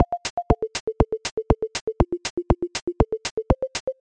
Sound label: BGM